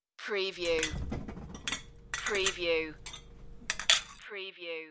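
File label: Stack Dish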